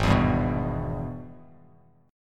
G sharp Minor Major 7th Sharp 5th G sharp down Bass Standard; strummed; JGuitar